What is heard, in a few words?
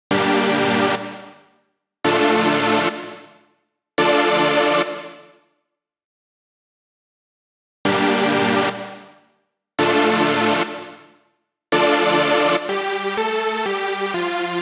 Synths, Dm, 124 bpm, Complex Electro Synths; Prime Loops; Invaders